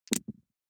DICE on Felt, Throw and Roll, Standard, 5 Five Dice; Samples; ArticulatedSounds; DICE Sfx Party (Extended)